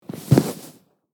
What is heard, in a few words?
Sitting down on a sofa; household